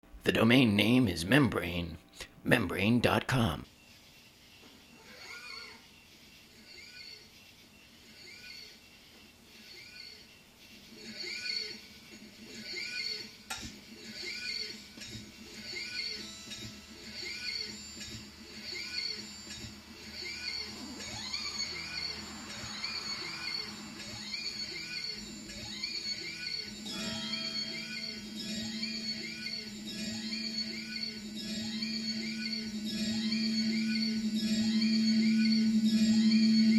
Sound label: songwritting with a guitar